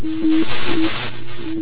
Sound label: knit re loop